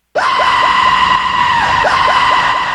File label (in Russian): bezumnyj krik